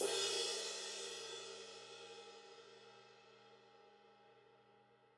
Ride crash; SAA Rock 20; apulSoft apTrigga2; Multi-Velocity Cymbal Samples

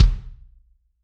Kick Hard, Drums

